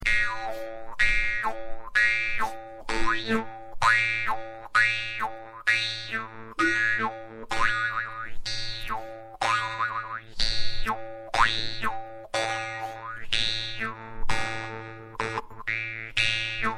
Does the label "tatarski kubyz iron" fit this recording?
Yes